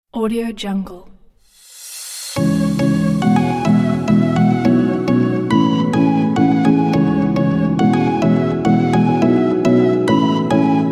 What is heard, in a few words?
summer holidays tropical track